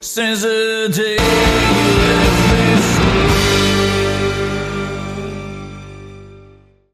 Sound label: Bumper